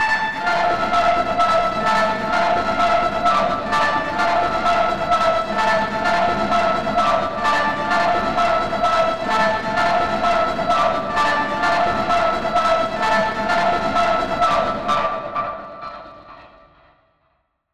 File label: Melody Clockworks 129 BPM; Melodies